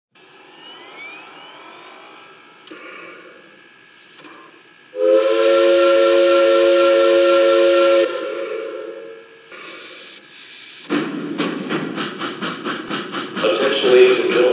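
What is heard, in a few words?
442 Atlantic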